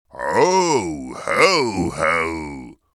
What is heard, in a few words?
ho ho